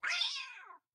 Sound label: hit; wilkins; Blind Drive